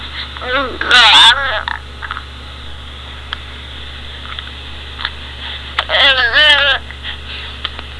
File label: babble